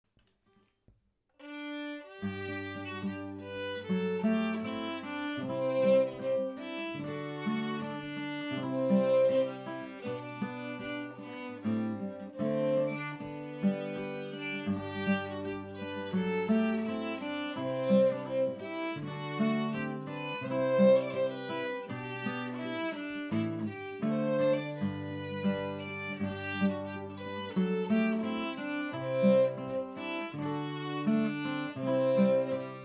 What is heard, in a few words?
Cumbernauld House harmony